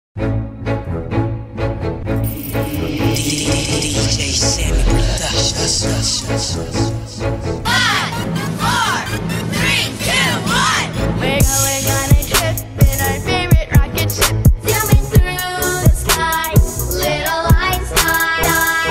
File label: trap remix